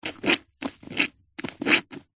household cleaning mop in bucket little water squelch; zapsplat